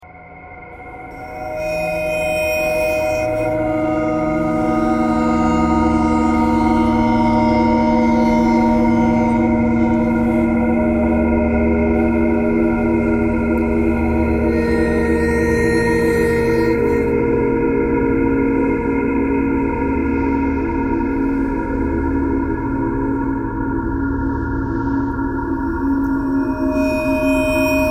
ice bowed vibes traffic kcore birds a thread simple; bowedmetal